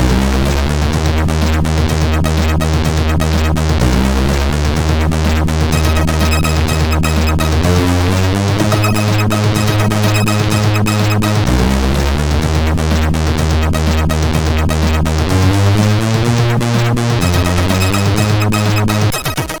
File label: sid 8580; Disco n Roll